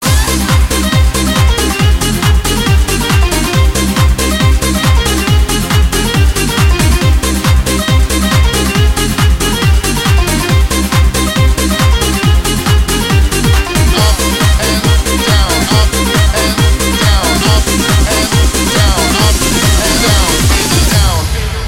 Fiddle

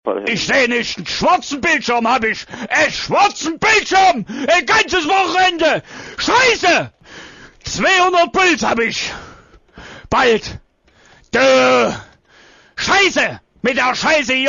200 Puls

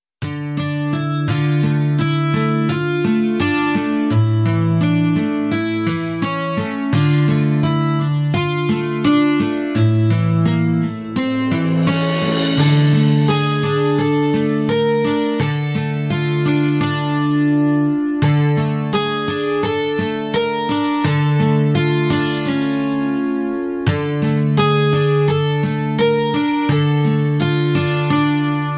Guitar Tune

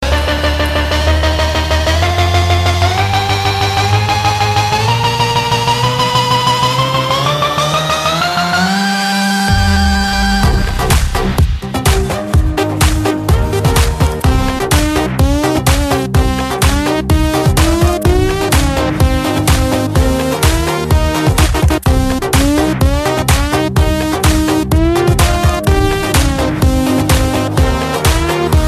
Rock; Pista

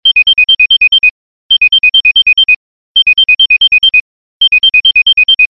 Message Alarm

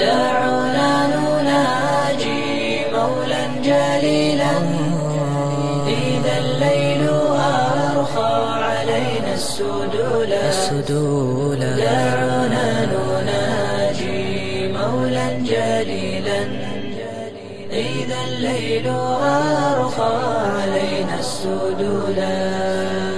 Arabic Nasheed

